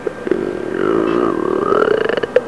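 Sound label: door creak; HAUSHALT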